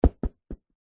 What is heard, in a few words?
soccer ball drop bounce carpet; sport